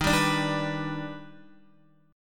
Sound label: D sharp Augmented Major 7th D sharp Guitar; strummed; x,6,7,7,7,7; JGuitar